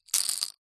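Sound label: leisure poker chips handful set down hard surface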